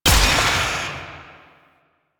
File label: Shot Medium; Weapons; Warframe; Duviri; Incarnon Adapters